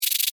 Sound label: Beetle Rattle, Animal, Beetle, Rattle, Vocalization, Insect, Bug; Beetles; Blast Wave